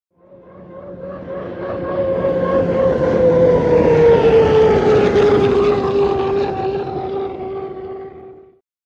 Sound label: Hydroplane By Fast